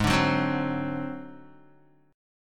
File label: Ab 7th Flat 5th down Guitar; Ab7b5; strummed; 4E,4A,5D,5G,5C,6F; chordshape; JGuitar